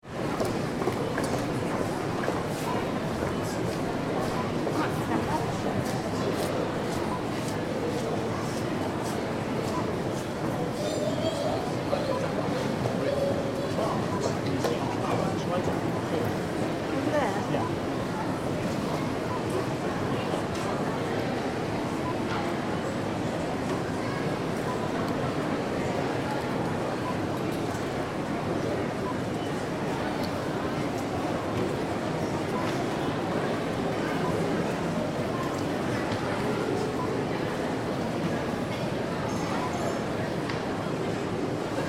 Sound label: Busy Shopping Centre